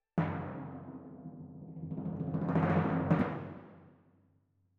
Twin F Timp

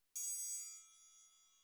TRIANGLE CLOSE

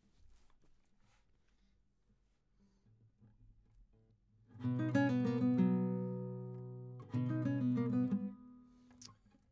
GTR

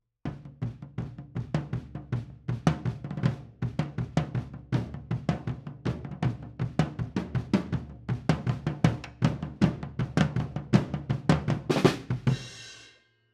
Kit, 160 BPM

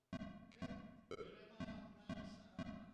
DRUM VERB

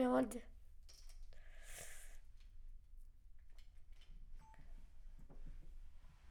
Children Choir; Studio Bekol Children Choir

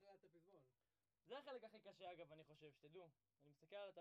Sax

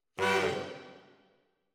ORTF SCHOEPS, piano

